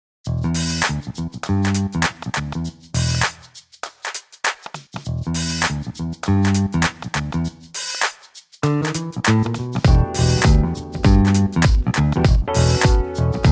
Picked Bass; VIVO S10

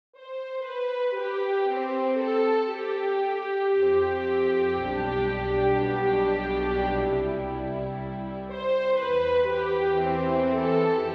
Orchestra; VIVO H10

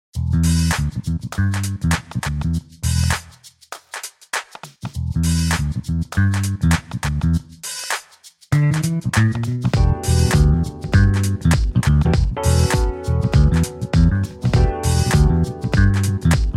Fingered Bass; VIVO S10

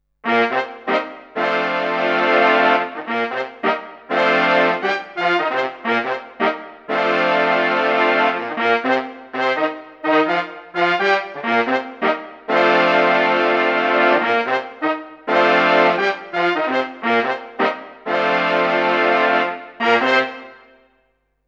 Brass Tape; VIVO S10

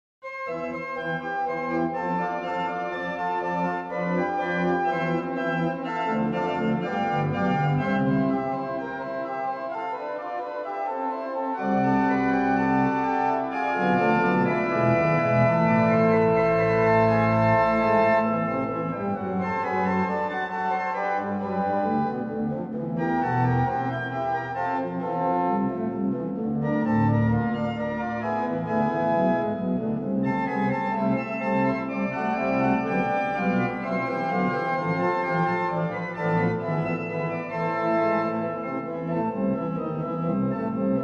Church Organ; dexibell

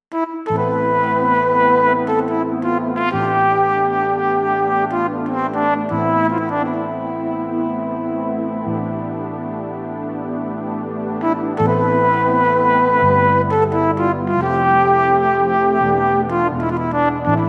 Flugelhorn

